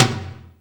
PWR TOM LO; Back In Time Records; Big Bang

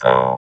VOX VOX, Electro Vox

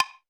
Lo Block, Alesis D4

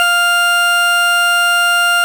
FARFISA ORGAN, Vintage Timetraveler; Sonic Reality

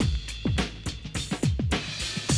Classic DrumLoops

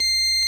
ORG VOX C, Continental; Roland LCDP09 Keys of the 60s and 70s 1

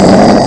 low monster; Amiga Soundtracker Sample Packs

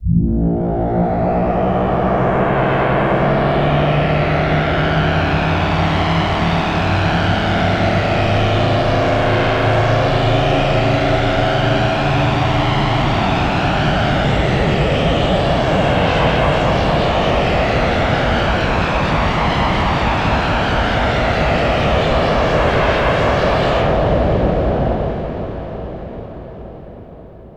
Accellerate To Space; SFX & One-Shots

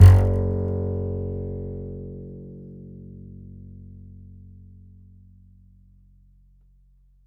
E, E string upright bass; Larry Seyer

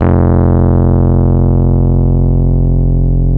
F 0, Electric Pianos; Wizoo

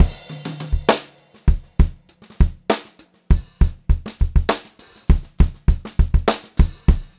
Pop-Rock Drumloops, 100; USB Soundscan vol.37; AKAI; 90 s Sample CDs

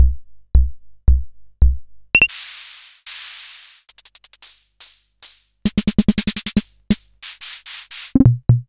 Hammond Auto Vari 64

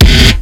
k1, Kawai K1 Drum Machines; 300 Drum Machines

